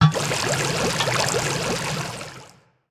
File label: Cauldron Underlay Play